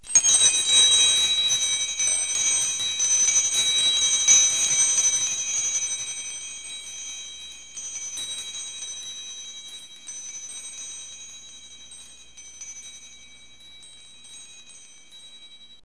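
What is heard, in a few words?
small bell; bells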